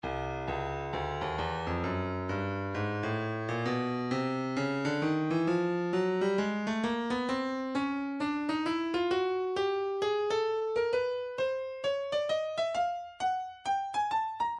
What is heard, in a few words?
breakbeat 066 bpm; Stylus Breakbeat Midi Files 065 069 Bpm; Chocolate Sundae